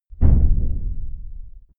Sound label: big metal impact low dull